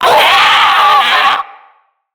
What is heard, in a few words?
Infested Ancient Pain; Enemies; Puss Ancient